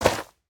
灵魂土踩踏声

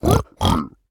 猪灵庆祝声

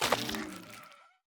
幽匿破坏声

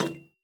铜灯 踩踏声

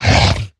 攻击声; 疣猪兽

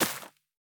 杜鹃破坏声

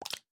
青蛙伸舌声

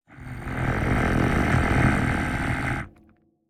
愤怒声; 监守者

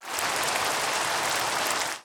雨声

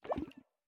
美西螈待机声

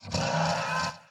骷髅马嘶鸣声

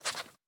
狐狸待机声

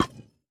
饰纹陶罐 插入 失败声

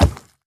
脚步声; 猪灵蛮兵

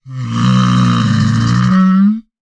骆驼站起声

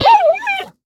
熊猫死亡声

